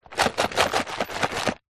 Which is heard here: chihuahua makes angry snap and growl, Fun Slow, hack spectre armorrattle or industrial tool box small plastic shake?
industrial tool box small plastic shake